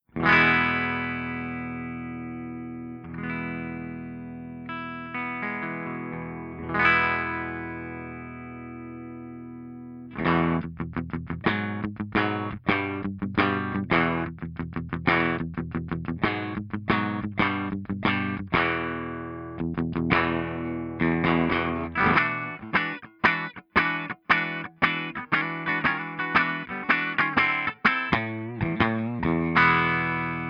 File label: FENDER TWIN STANDARD P90